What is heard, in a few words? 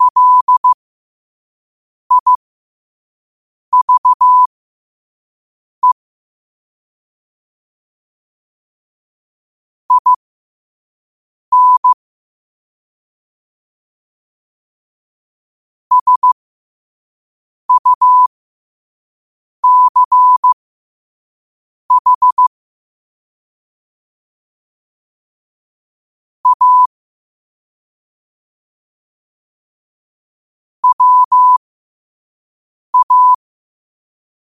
5 wpm, morse